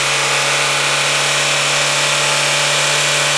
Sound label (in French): moteur Laguna; le moteur d'une Renault Laguna à environ 4000 tours/min